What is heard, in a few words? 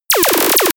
laser machinegun overload; krieg-waffen; soundpool